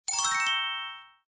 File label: card show